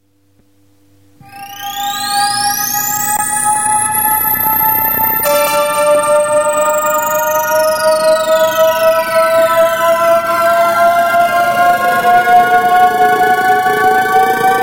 zvuki inoplanetjan

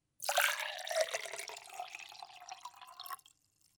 zvuk nalivanija vody v stakan

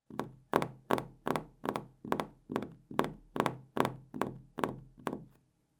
stuk palcev

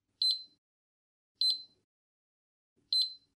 zvuk sensora

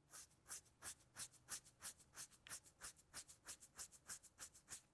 zvuk chesatsja

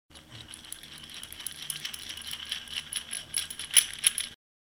zvuk zakruchivanija

